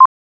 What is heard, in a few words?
BEEP HIG